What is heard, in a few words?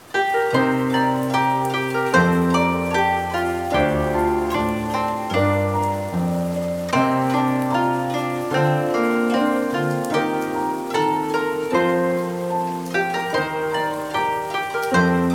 japanese lo-fi; kamitourou